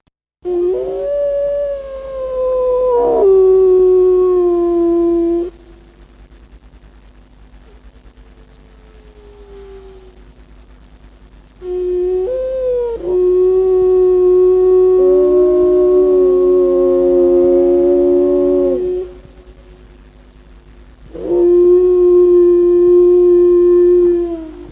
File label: Wolfs